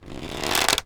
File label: Longbow Pull Back; Timelock VR